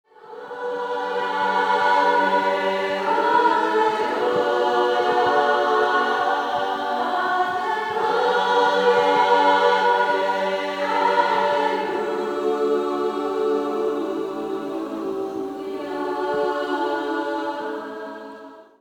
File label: Halleluja